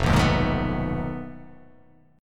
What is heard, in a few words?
F 7th Sharp 9th Flat 5th F down; F7#9b5 strummed